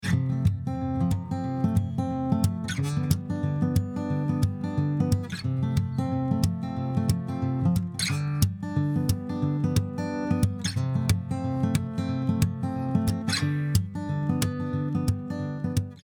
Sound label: AG OVERCOMPRESSED